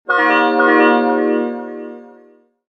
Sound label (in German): ipod sms toene iphone style